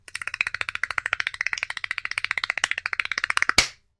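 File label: teeth clatter on the deck; jivotnyie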